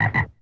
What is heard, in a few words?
frog